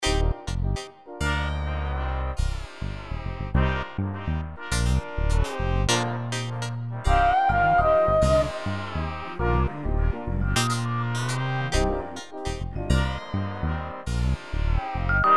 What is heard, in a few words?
drum